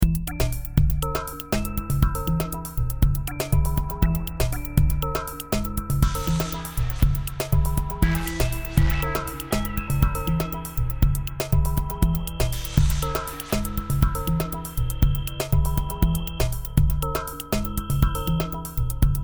blitzen machine

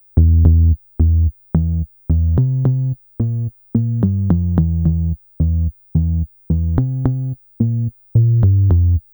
Volca Keys Test Clean

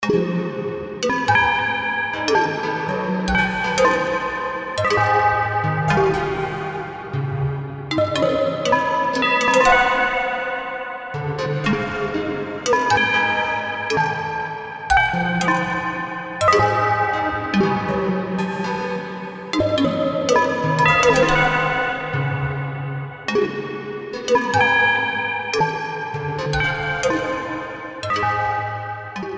randomized pitch durations

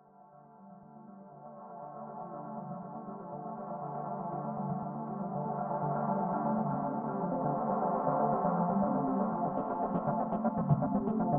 Intro to Heavy Synth Track in Six

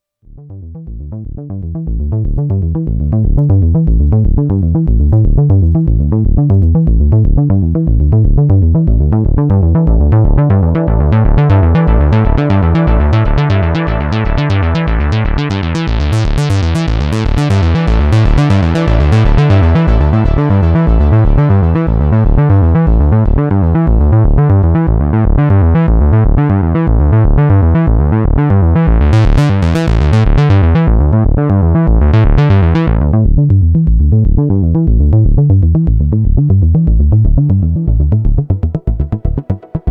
Synth Bass Through Resonators

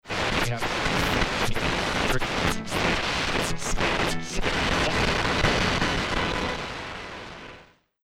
am radio static

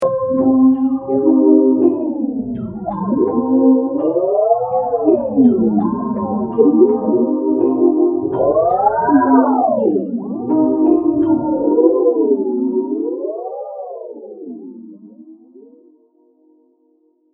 liquify electric piano pattern

